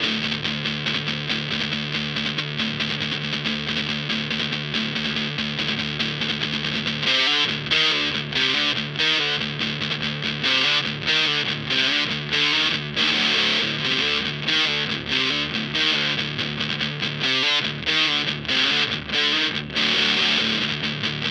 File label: Orange Terror Stamp amp